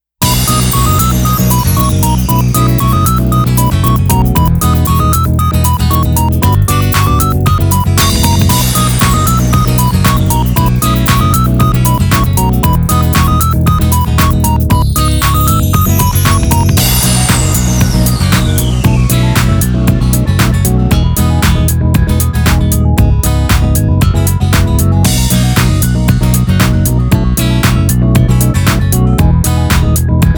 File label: No Shower, No Rainbow instrumental; Kantai Collector II